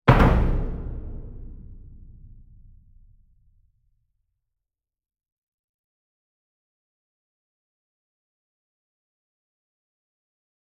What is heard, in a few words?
SINGLE DRUM Verdi 66 Inch Double Soft Beater Medium; boom library; Cinematic Strikes Construction Kit